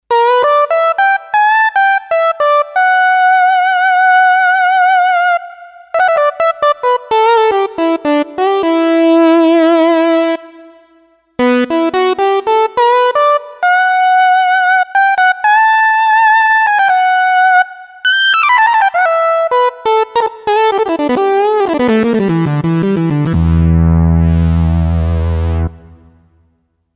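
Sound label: Vintage Lead, Kawai K5000S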